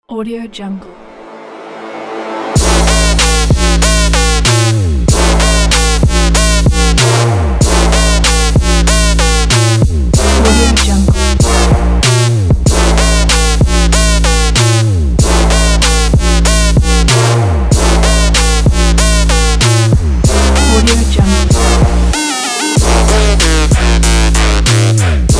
trap

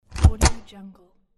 stamp

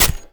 layers mechanical; sinematic neon source; soundmorph

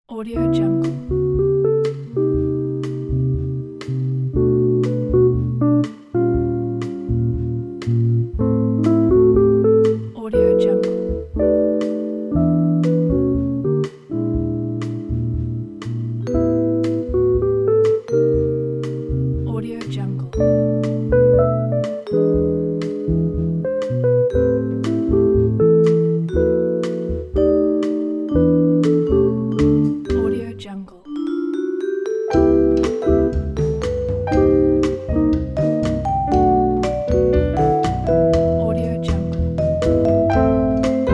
bossa nova beat; wingzara

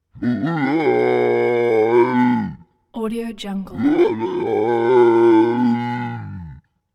humanoid creature voice scream yell call out

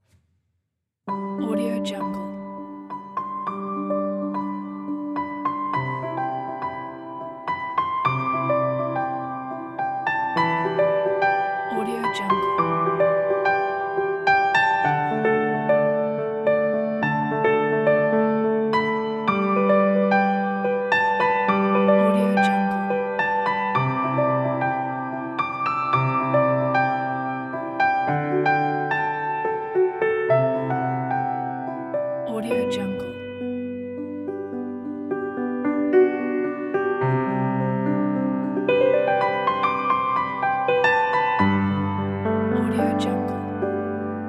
light spring piano improvisation

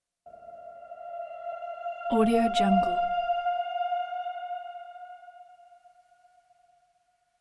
demon scream by soft pass bys

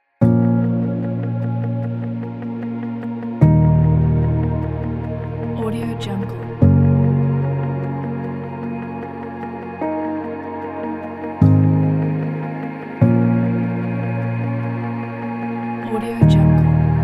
stirring ambient

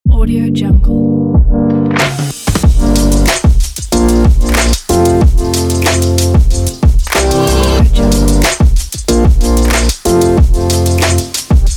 sport motivational

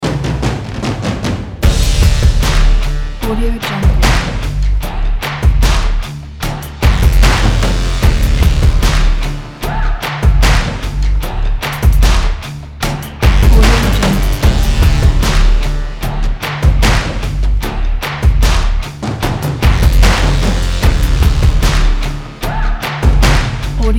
cinematic angry drums; waderman

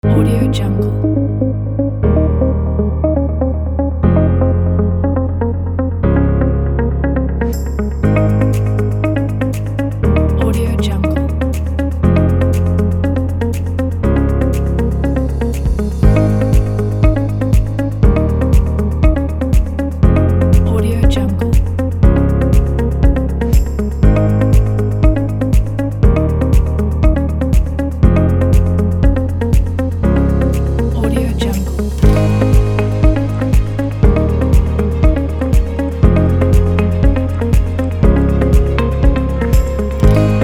rise to success

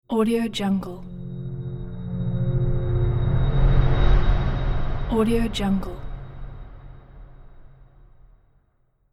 twilight transition of fantasy horror

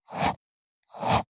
handwriting fountain pen

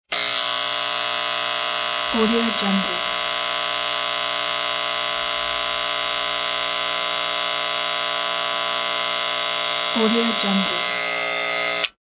electric hair clipper

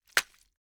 knife slicing flesh stabbing many rapid chops

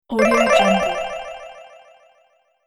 echo delay game bonus

